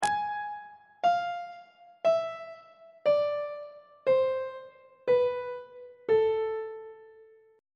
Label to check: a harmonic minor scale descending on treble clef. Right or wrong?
Right